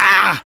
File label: Pain, Barks; Kuva Lich; Persona D